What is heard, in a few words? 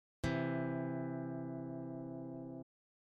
intervals harmonic major third, guitar